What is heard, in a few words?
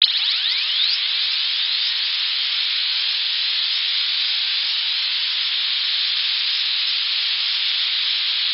3d string a tone sixth